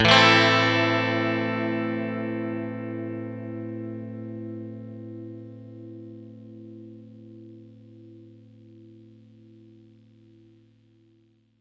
clean chord Am, clean electric guitar chords

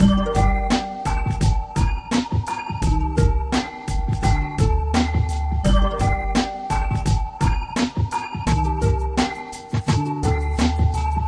lofi hiphop bell and harp beat; SAMPLED MUSIC LOOPS

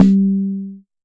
Jomox MBrane, drum machines; Jomox MBrane 11

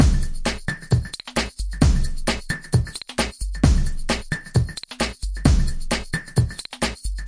drum funk; Blues

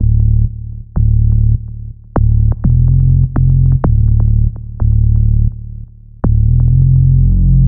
verby punchstart bassline, bass loops

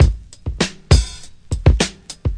classic brk; VIBRIS CLASSIC BREAKS

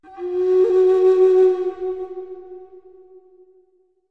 native flute; Flutes

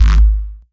Evil Bass Sounds, bass hit; multisampled; Desecrated Productions

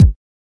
kik

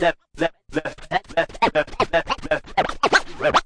Scratching and Turntablism

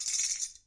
tamborine; Western and Latin Percussion; single hits

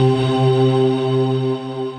Synthesizer Middle C Notes, Lead

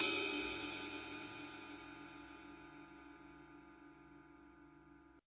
CYM RIDE

